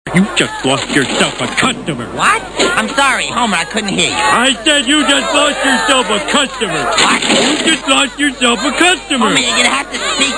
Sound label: flaming